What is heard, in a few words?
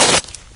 hit, imp; doom3